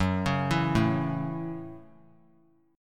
Gb Minor 7th; arpeggiated; JGuitar

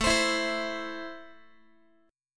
A Minor A down Guitar; Am strummed; x,17,15,14,16,x; JGuitar

